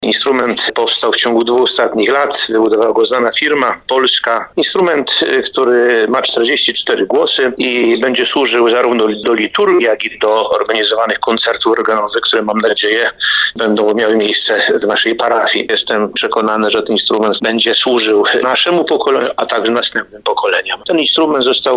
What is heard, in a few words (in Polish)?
organy; mielec; zbudzcie sie